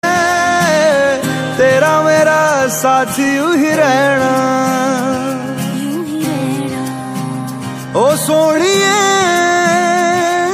bollywood ringtone

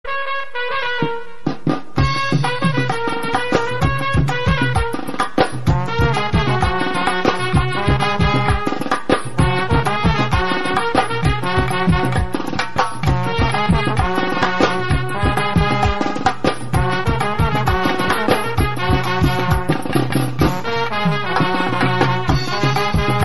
cool crazy srilankan papere band ringtone

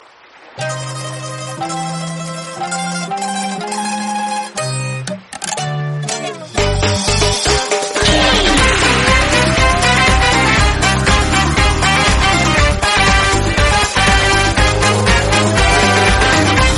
italian dance ring

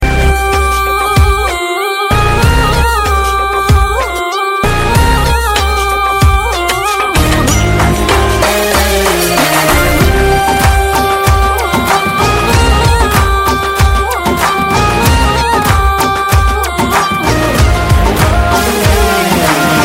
arabic ringtone

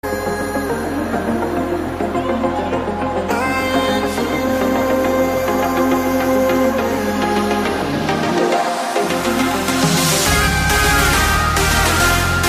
trance ringtone

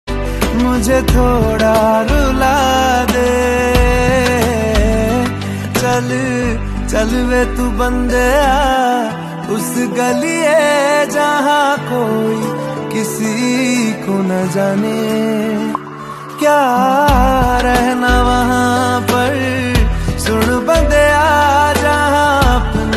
ringtone

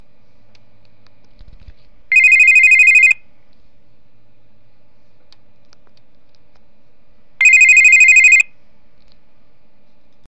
classic tone ringtone

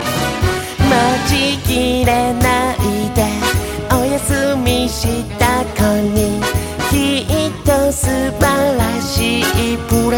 new italiano christmas tune ringtone

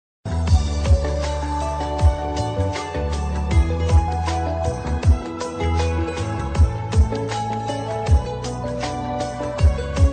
oppo f5 original ringtone

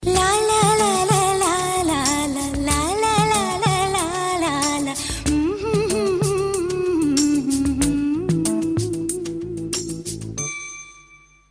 malayalam roman tune ringtone

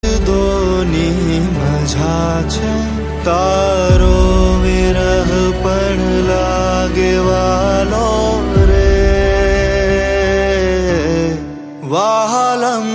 gujarati ringtone

